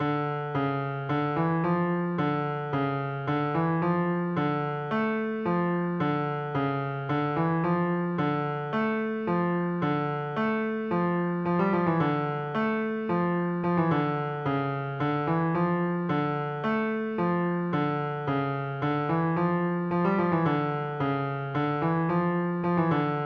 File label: fugue